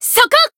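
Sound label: Voice attack, Japanese; kikyou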